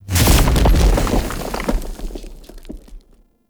Drunken Flame Pillar Burst; Characters; Enemy; Abilities; Circade

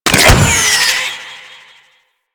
Infested Moa Nanite Fire; Warframe; Attacks

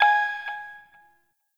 Hack Minigame Touch Node

